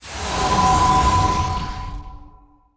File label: spell blast; archer